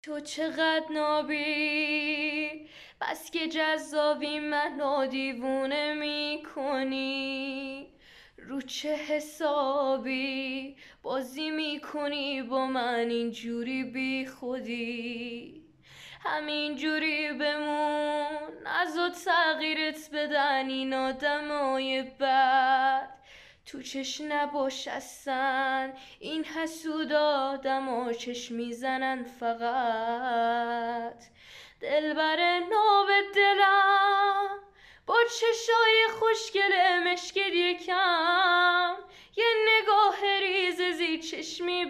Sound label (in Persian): اهنگ با صدای دختر